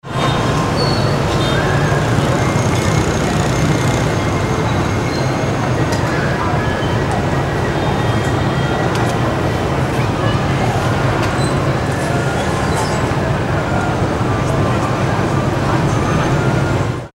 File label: street atmo crowded singapore